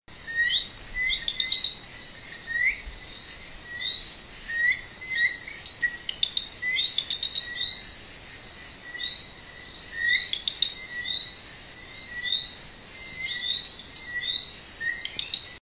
eleutherodactylus martinicensis eggleston dominica; single, rising whistle, sometimes followed by a short series of clicks; West Indian